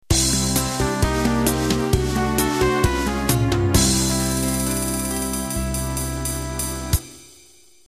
Pop Bossa End

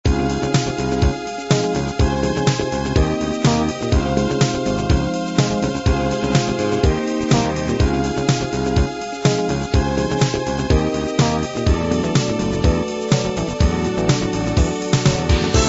disco miami

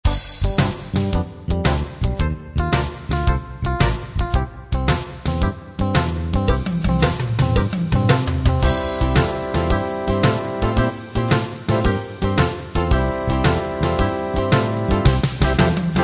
r and b

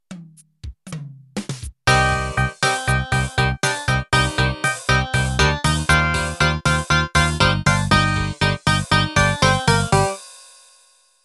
Country Rock Intro

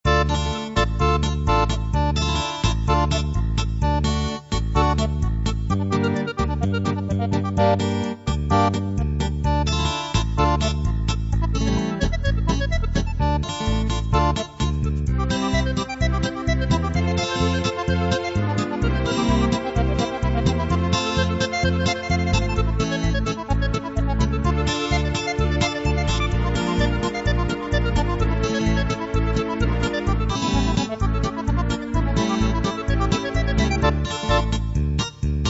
tango

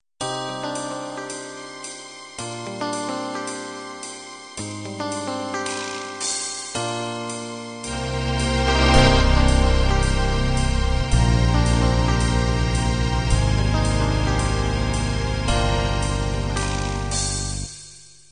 windy beat intro

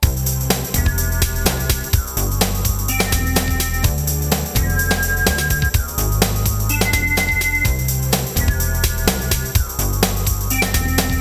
jungle; jungleY.sty; SD demo

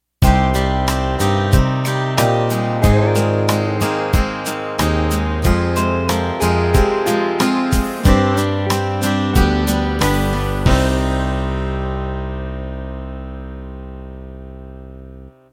Country Ballad End

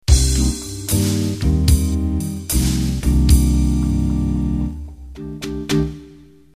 Jazz Vox End

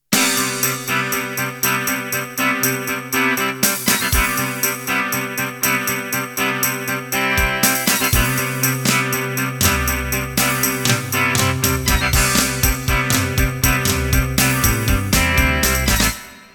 Heavy Rock Intro

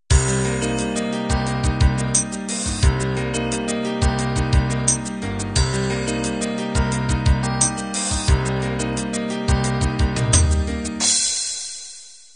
cinema ballad intro

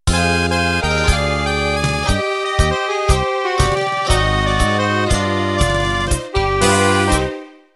Tango Italiano End

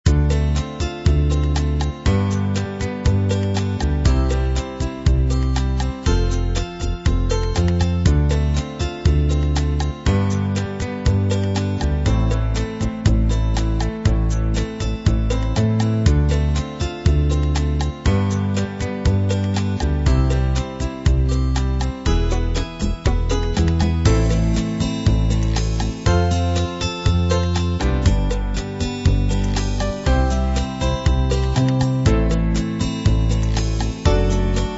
unplugged 8beat

